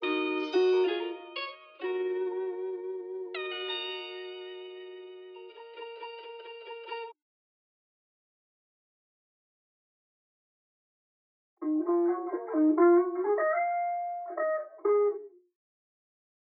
135 BPM D# Min Guitars; Melodies; Loop Stems; Melody Mystery Expansion; Fake

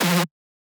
synth screech oneshot F dry; Synth Hits; GhostHack

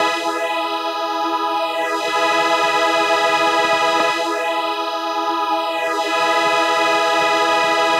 Loop Atmosphere 120 E, Deep House; Ghosthack; Advent Calendar 2019

